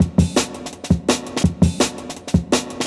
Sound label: 167, Jungle Breaks; 90s Sample CDs; Original Jungle Breaks